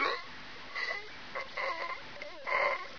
die, hgrunt; Source Engine; bshift

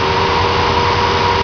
turret rot, ambience

